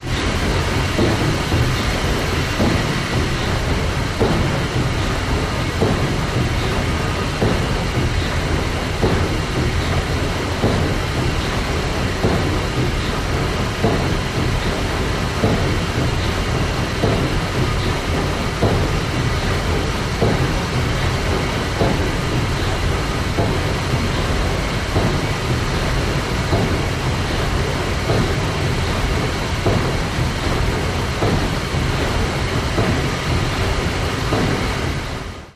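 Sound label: steam engine half, WWII Liberty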